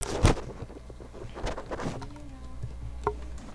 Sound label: sharpen